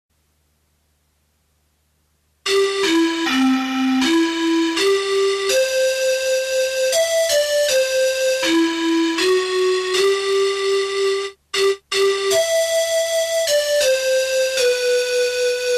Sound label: National Anthem Melody